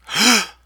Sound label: Man Gasping